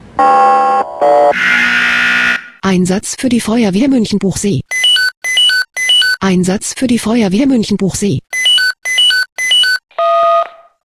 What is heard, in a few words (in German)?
SMS Alarmierungstone; FW Muenchenb